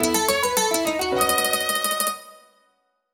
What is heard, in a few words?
Jingle Salon Opening Table; Clubhouse Games: 51 Worldwide Classics